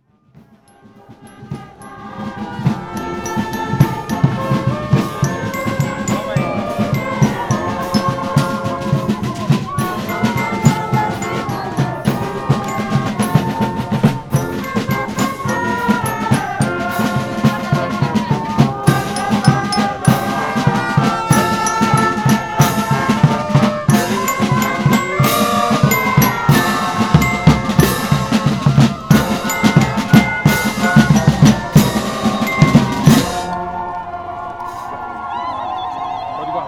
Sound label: procession fanfare; la republiqe des enfants